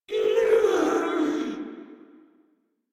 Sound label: Death Grineer Female, Barks, Battle; Warframe